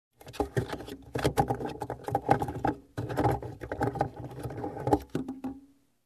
Move around objects in car

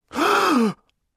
Surprised Deep Inhale

